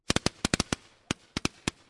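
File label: ambience Party mode Crowd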